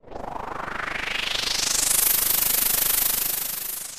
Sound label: spin